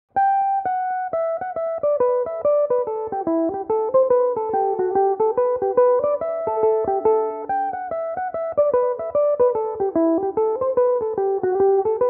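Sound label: G major, irish mandolin, tunes; Belmuse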